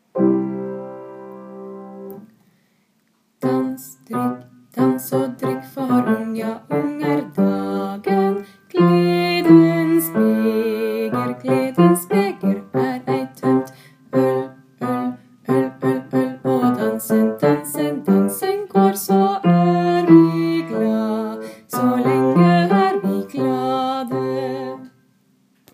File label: BASS